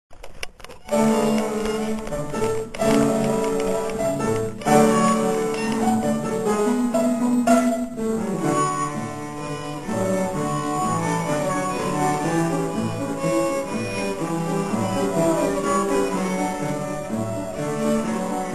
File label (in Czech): klav trio polka